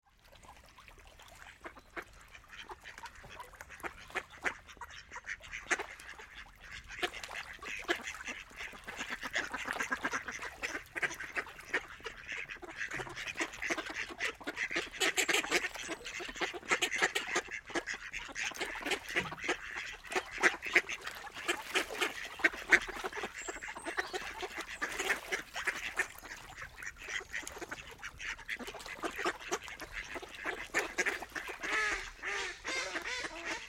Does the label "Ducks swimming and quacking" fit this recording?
Yes